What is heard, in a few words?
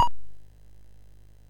sid MOS6581R2; California Games